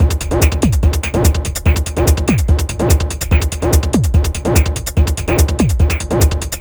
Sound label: Electronic, Drums & Percussion; Sonic Foundry (Sony Creative Software) - Electronic Point Blank